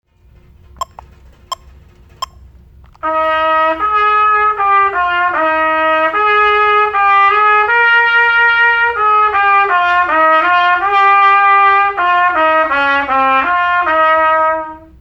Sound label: trumpet set